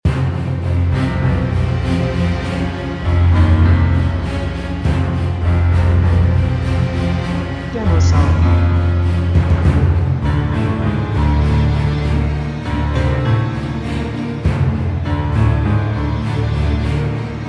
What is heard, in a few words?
Piano Loop